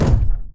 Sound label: Footstep Jog Mid; Titanfall 2